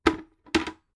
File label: bucket put down heavy handle hit